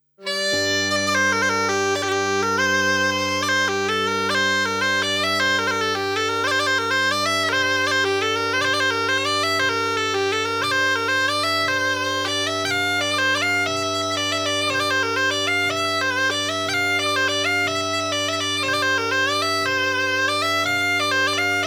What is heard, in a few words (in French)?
Mazurka; Mazurka de Chabrier